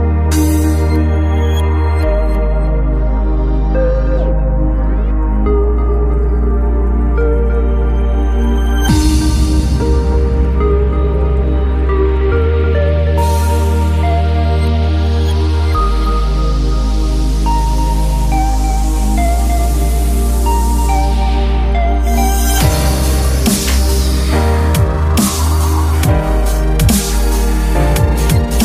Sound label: space bells dubstep